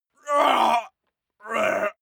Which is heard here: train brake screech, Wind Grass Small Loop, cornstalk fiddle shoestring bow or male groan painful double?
male groan painful double